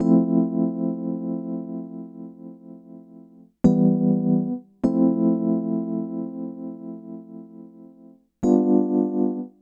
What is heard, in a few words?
EP, Keyboards, Electric Pianos